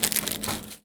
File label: Foley